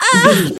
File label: voice female hurt pain mild